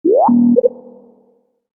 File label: Mail arrive, Mail Arrive: Alert To Indicate New E-Mail; Chimes; Multimedia; Stuart Duffield